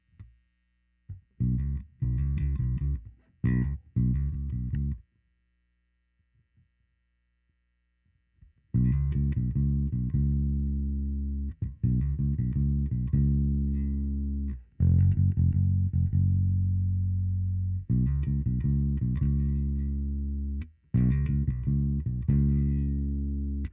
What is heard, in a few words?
new Bass